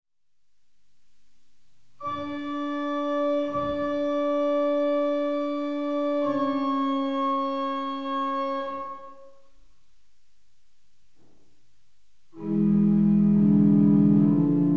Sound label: prelude organ; 6 preludes (organ)